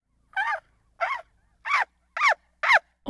elephant grumble; prosoundfx

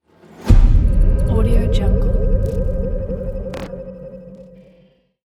hit

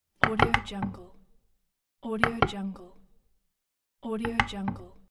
wood buttons interface

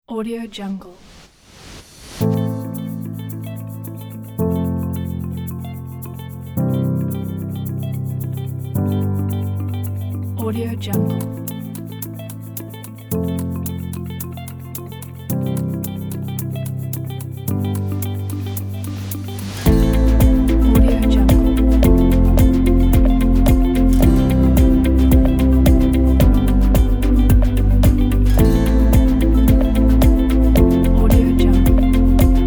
inspiring mood

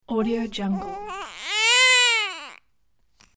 baby sniffling and crying

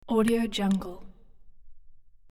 button press with spring release; hollywoodedge

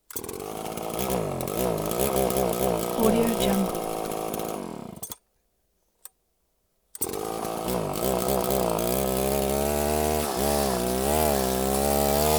chainsaw stall

